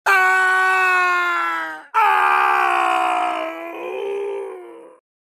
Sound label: extreme boost k o scream; mk9 johnny cage